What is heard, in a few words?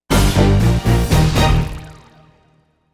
Duet (opening) (G minor)